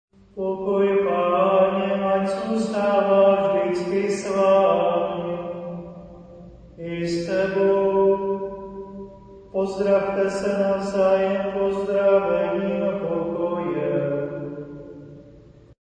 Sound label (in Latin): pax domini choral